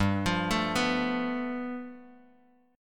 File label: G 9th, Bass Standard; G9 arpeggiated